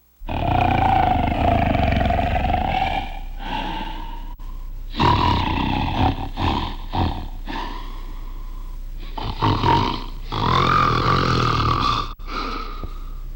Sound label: Snoring